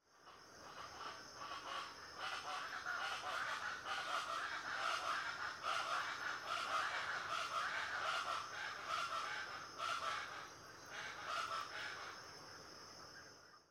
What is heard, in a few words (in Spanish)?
PATOS SALVAJES EN CEJA DE SELVA CUSCO